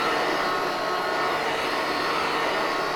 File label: Engine Not Touching